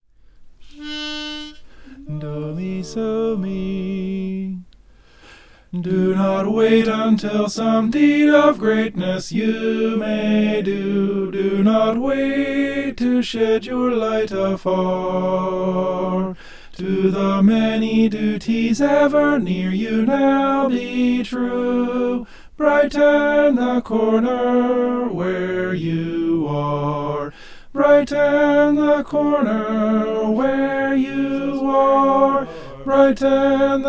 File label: soprano; brighten the corner